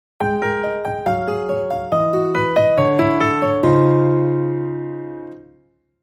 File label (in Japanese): 落ち着いたジングル